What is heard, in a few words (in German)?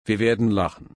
lachen